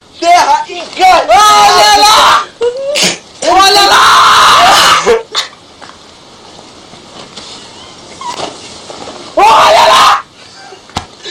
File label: alalalaalalal; OLHA LÁÁÁÁÁÁÁÁ